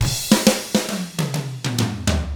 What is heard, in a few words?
ROCK, Drums; ILIO; Double Platinum Drums 1